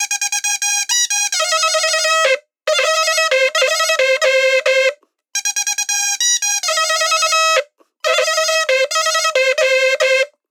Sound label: Pepa BPM 90; Gully Loops; waves of bihu vol 1